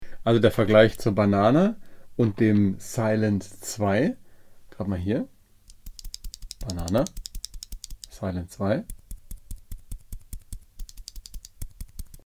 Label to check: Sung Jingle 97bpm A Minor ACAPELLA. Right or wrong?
Wrong